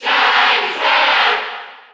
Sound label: Cheer Spanish; Charizard; SSB4